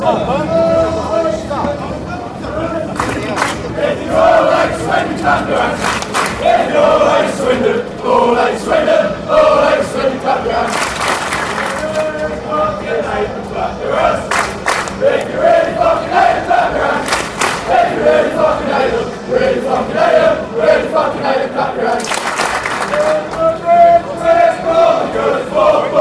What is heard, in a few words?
if you all hate swindon clap yer hands